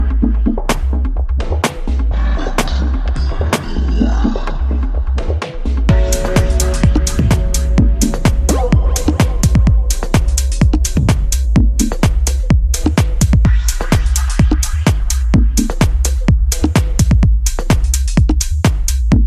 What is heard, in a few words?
House; Bubble London